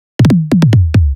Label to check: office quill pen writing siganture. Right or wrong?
Wrong